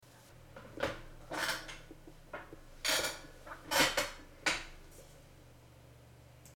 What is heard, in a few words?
creaky chair